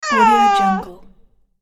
toddler baby

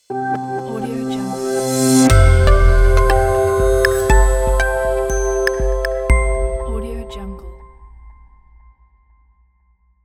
positive digital ident

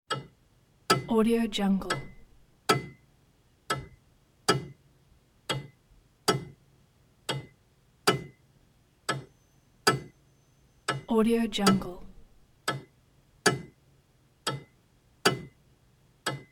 grandfather clock ticking; sound-ideas

